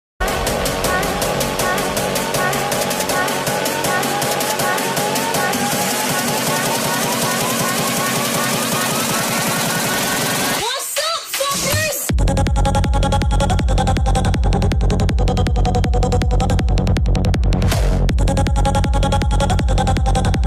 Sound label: psytrance ringtone